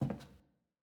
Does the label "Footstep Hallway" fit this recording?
Yes